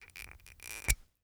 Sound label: Household Glass Wine Bottle Cork Removing